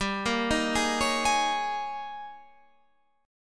Ab Major 7th Suspended 2nd Suspended 4th G

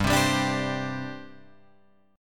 G Suspended 4th G down Guitar Open F; Gsus4 strummed; JGuitar

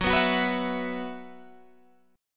F sharp 7th Suspended 4th; strummed; F#7sus4; 14,14,16,16,17,14

